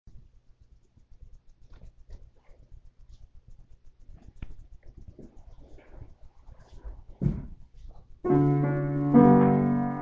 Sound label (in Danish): sopran